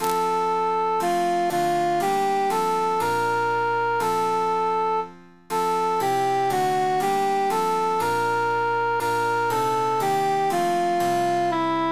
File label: cantus emphasized; o occhi